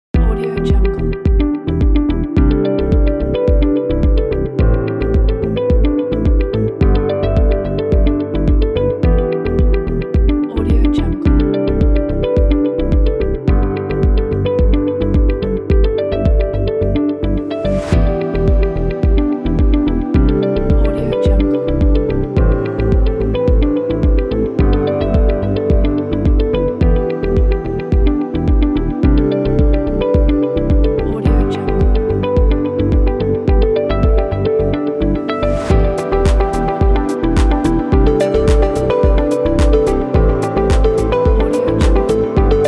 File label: corporate electronic